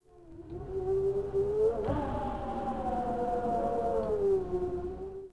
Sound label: Tundra Wind Gust; Ambient; Civ4